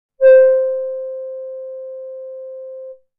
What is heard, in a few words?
Basic Pulse, synthlead